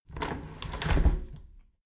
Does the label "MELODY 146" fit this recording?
No